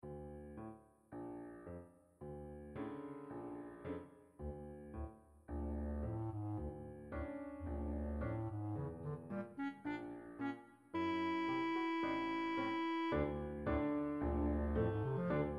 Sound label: for bassoon and piano; the seven dwarfs